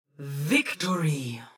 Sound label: Victory; Overwatch; Overwatch Voice Lines